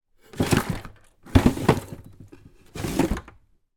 household kitchen draw unit draw open close sharply; zapsplat